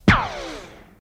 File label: Machine Gun Silenced, silenced bursts